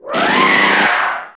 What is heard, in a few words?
dragon dam; eqclassic